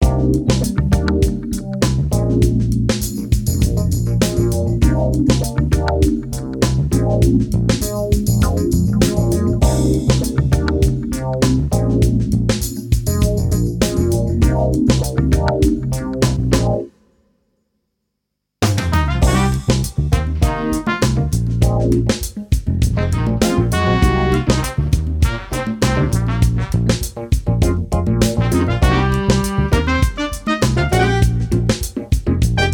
funky; EVE New Generation X; detective

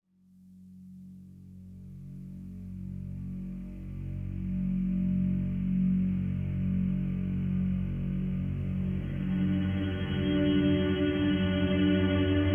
Menu Music; Netherworld: Before Time I Stand

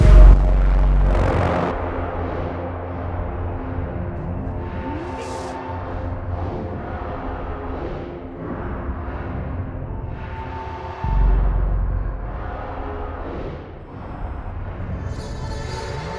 Ambience

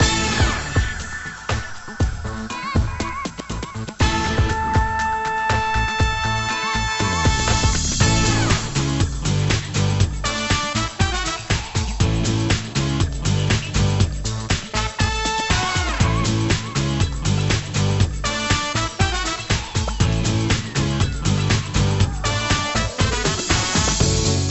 Bg Menu Music; Hasbro Family Game Night; nds

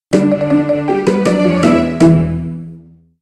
Minor Prize

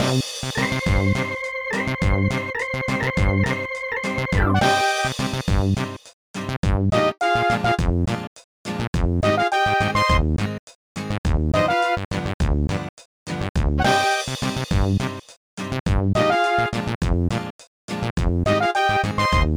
Back Beat Battle

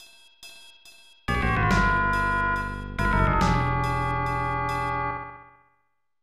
Mission Failed; super air diver 2; snes

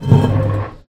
manhole metal slide door open close; Poppy Playtime; ProSoundCollection v1 3; Doors

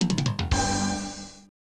Score Count; Armored Warriors; arcade

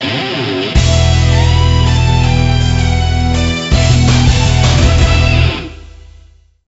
Game Over; Kamen Rider Climax Heroes OOO; psp

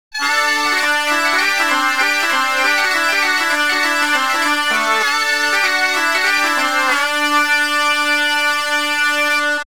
Bagpipe Tune; Spyro the Dragon 2 Ripto's Rage

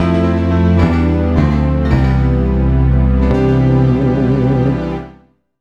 Jingle Game Over

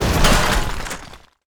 Fence Crash; Lucius III

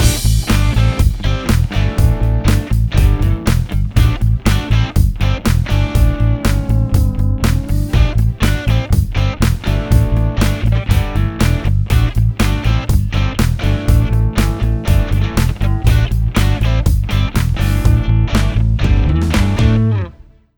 Robot Dance

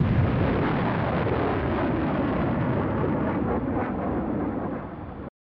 Big Explosion; super battletank 2; snes

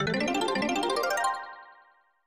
Tsume Game Clear

